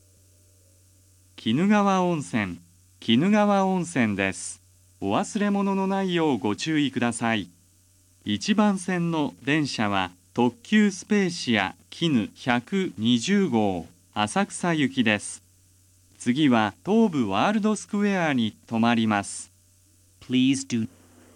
kinugawa-onsen limited express asakusa next tobu world square, tobu station; spacia-kinu120